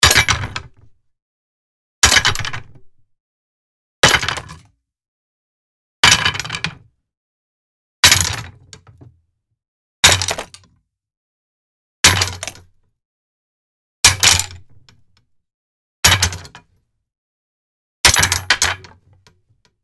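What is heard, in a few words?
spear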